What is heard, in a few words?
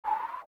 Fly; Troll Face Quest Unlucky; Android